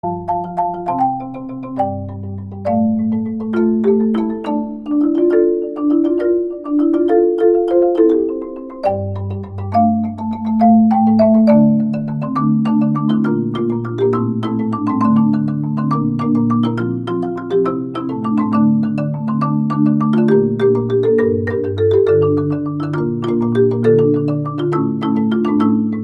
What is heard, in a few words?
Marimba